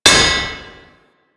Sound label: Worker Hammer Impact Metal, weapons